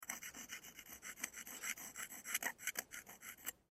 Dentist Plaque, Dentist Plaque Scraper Scrape Honk Rapid; Hospital; Emergency